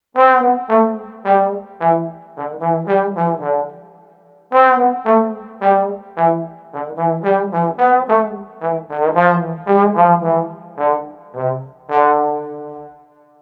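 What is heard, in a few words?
Street Trombone New Orleans Bounce 110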